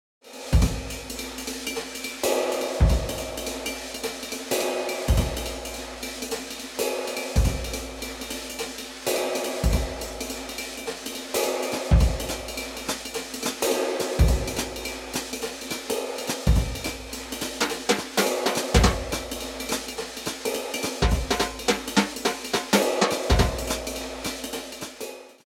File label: cinematic rides